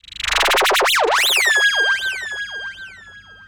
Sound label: fx; Google; NewWave Labs